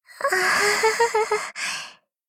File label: laugh